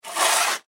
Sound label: Industry Shovel Scoop Scrape Cement Single; Hand Tools